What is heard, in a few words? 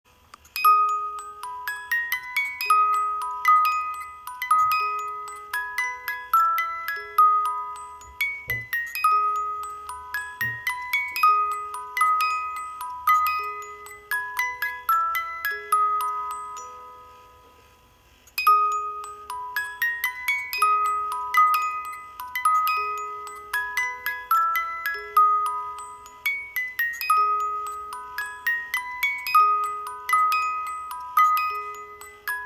swan lake music box